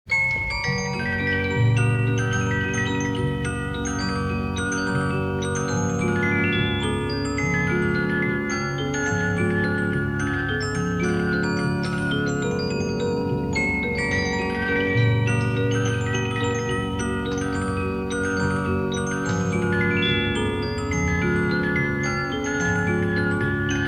Regina Music Box

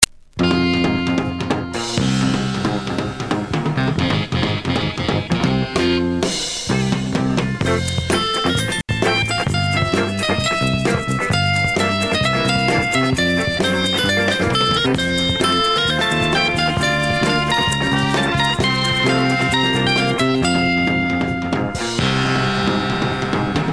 Rock Instrumental

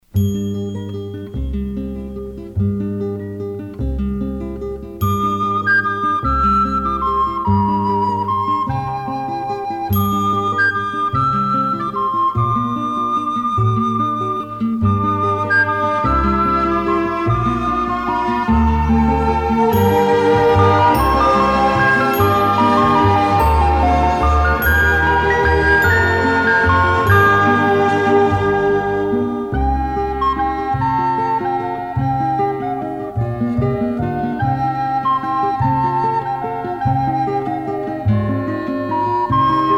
Ad Music

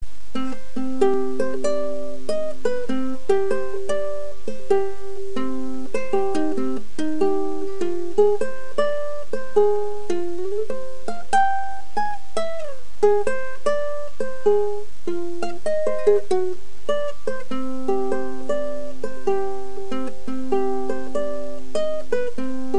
Ukulele player

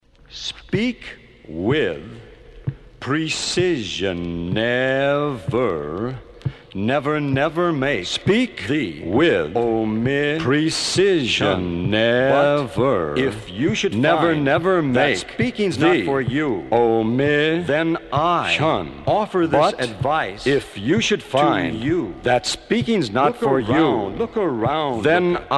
Fugue and Variations

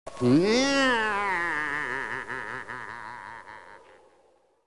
laugh; sir graves

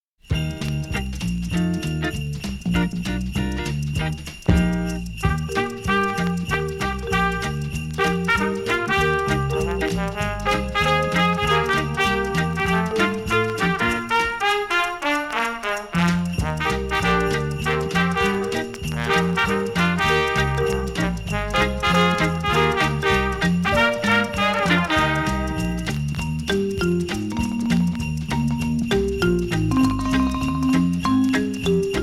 Brass, Jingle Bells

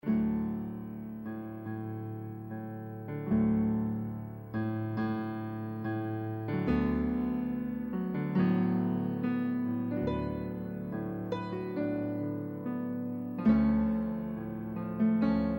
PIANO

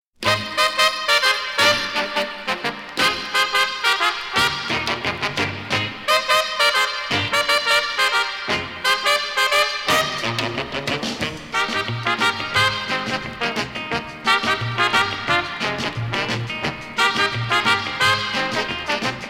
Mexican Trumpets

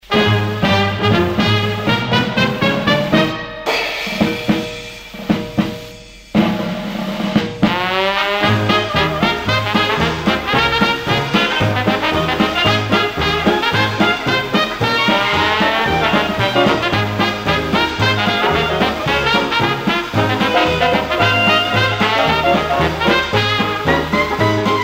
Dixieland Band, Elephant Stomp

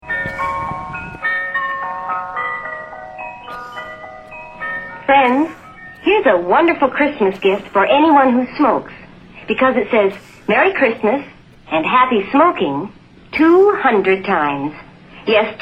Jingle Bells; Special Ed Xmas

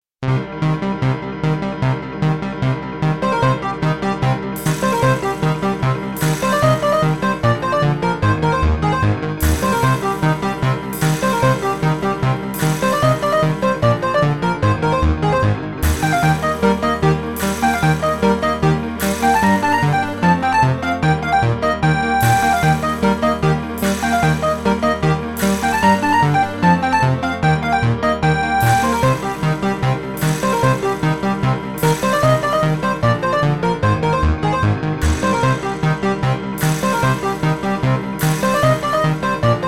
midi file

